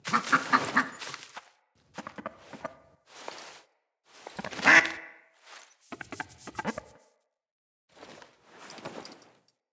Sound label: Interact Pet Duck; Foley